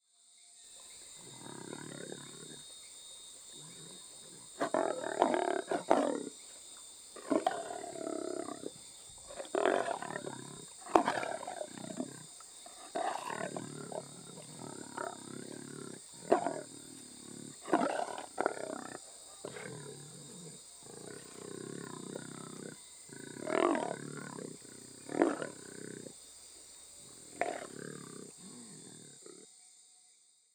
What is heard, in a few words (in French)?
Jaguar